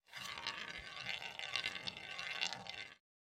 roulette spin

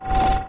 ammo spawn

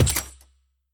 gem counter

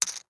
poker win

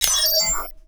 zoom In

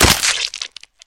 zombie bite

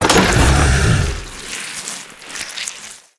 vine Flower Burst; jumanji

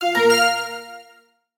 hit

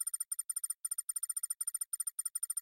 typing

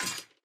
block metal destroy

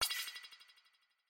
balloon bonus win stop

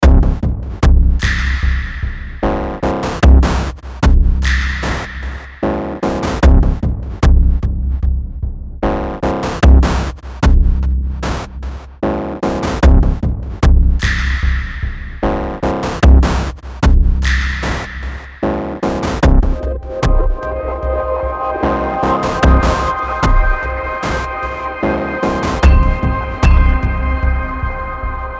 Tension Music

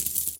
coins drop

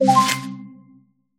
Wild Card Use; Microsoft Solitaire Collection

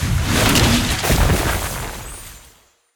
croc Jump; jumanji

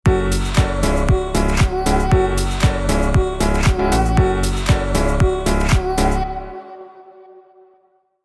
powerful rhythmic uplifting sting

